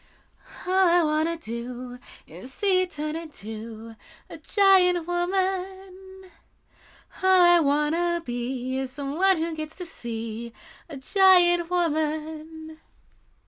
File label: giant woman singing